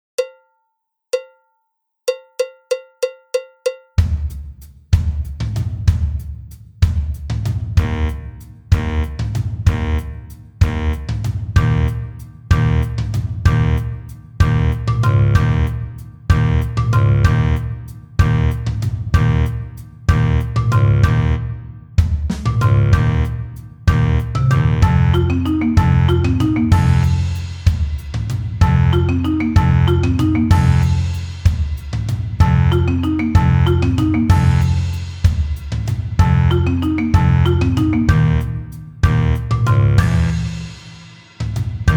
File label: Sax Eb Fast 190 bpm; SaxTroll